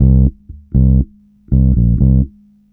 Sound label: HEY BASSE, Roots Disco; USB Soundscan vol.32; AKAI